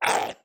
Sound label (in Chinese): 炽足兽受伤声